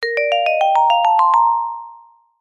cartoon ascend vibraphone happy fun cheeky musical; zapsplat